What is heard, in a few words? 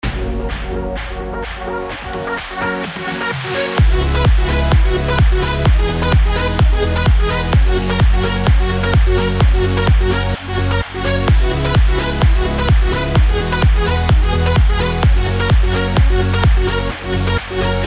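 progressive house, C major, 128 bpm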